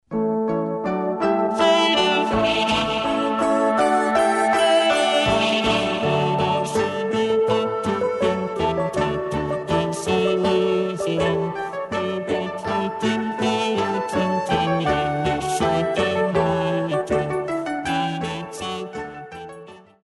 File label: Clockwork march; dystopia